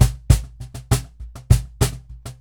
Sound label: Cajon Loop 100BPM; musicradar